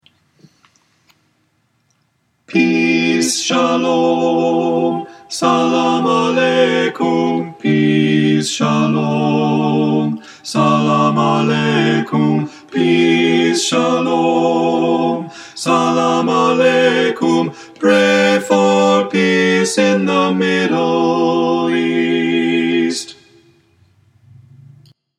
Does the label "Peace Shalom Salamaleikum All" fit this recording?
Yes